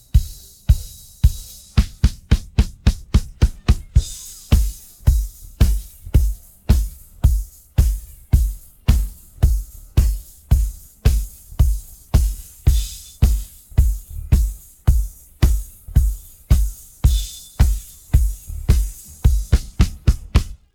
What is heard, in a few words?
pattern, drums